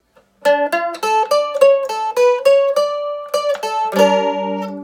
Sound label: Bulgarian folk intro simple, mandolin